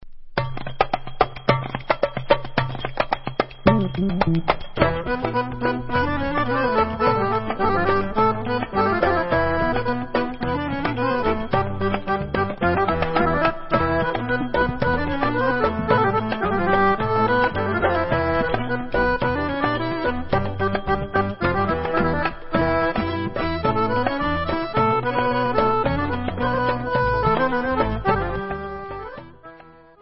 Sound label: Debka Instrumental; Debka Chayim